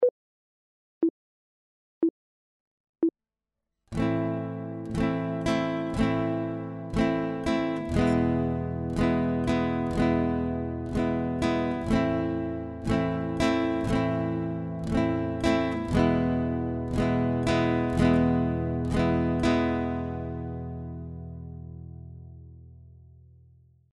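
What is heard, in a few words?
chord, guitar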